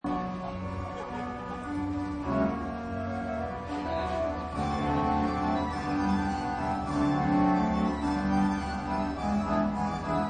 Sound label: Merry-Go-Round Music; Amusement Park Sound Effects